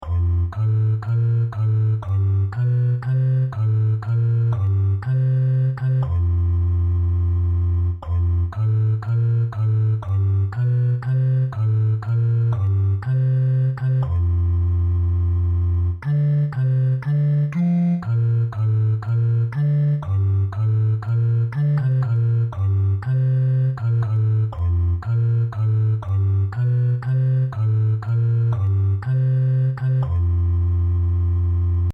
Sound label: For the Fruits of this Creation Bass